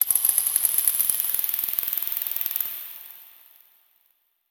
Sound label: environment forest cicada chirp